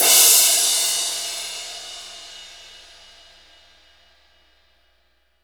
crash; East Collexion; Drum 1 Dry

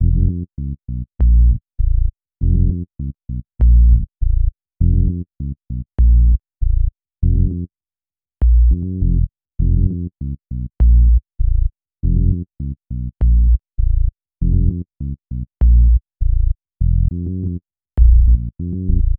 Bass, Hip-Hop

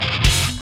GTR

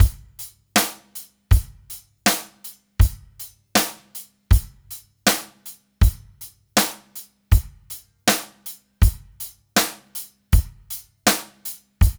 DRUMS; East West; Funky Ass Loops

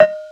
Casio SA-10 Cow; 300 Drum Machines

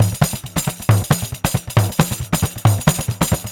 Pandeiro, 4-4 Drum Loops, Perc Loops; Drum Tools

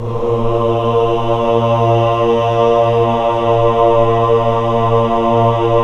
CHO AH, Choir of Ahs; Roland - LA Composer vol. 1

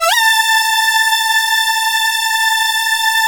lead synth

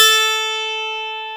12 STR A4, PIANO; AKAI S-Series CD-ROM Sound Library VOL-8

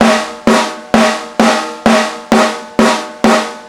snares, drums acoustic, 130bpm, metal drum; musicradar-metal-drum-samples; roll

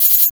PERC2; USB Soundscan vol.34; Burning Grunge Hip Hop; AKAI; TIMBA

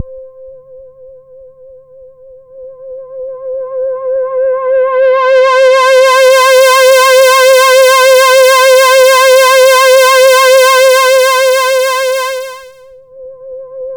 C4, SynthLine; 90 s Sample CDs; NiceBeats; SynthLine VOL-21

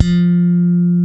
Bass, F 4, ALL THUMBS; Spectrasonics; Bass Legends

